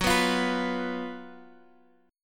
F sharp Major 7th Suspended 4th F sharp, Guitar Standard; strummed; x,x,4,4,6,7